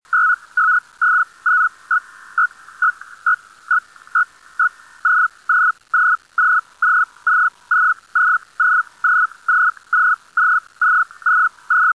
sitora, digital modes

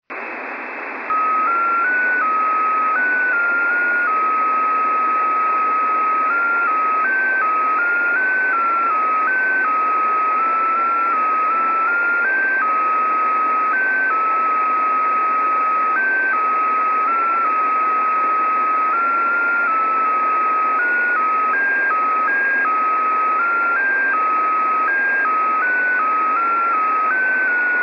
JT65B Example

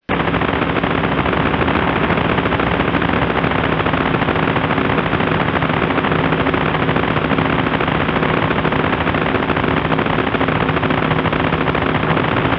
loran c, digital modes

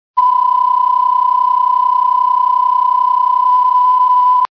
psk31, digital modes

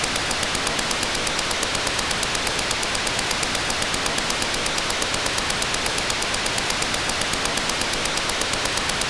SSDV AF; GASPACS

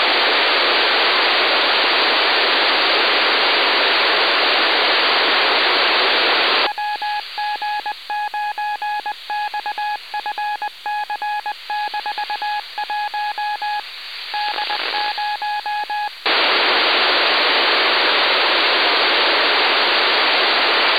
437012kHz AF; ArduSat-1

